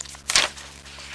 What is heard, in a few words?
page turn